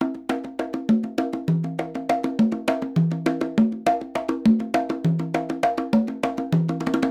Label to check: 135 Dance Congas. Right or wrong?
Right